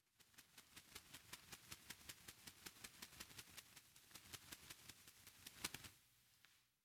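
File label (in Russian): motylek zvuk, moth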